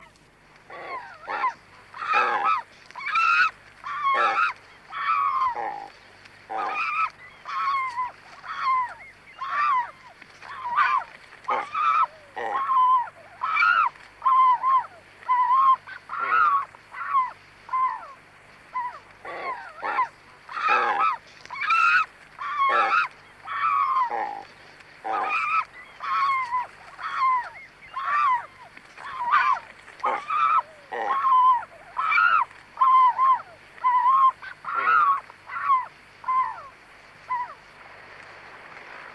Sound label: King Shag calls at colony